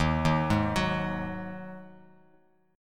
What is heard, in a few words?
D sharp Minor Double Flat 5th, Bass Standard; arpeggiated